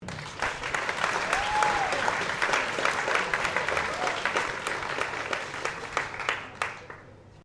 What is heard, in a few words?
Clapping applause 500 auditorium, Conference crowd clapping; Human